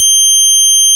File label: DX EP C6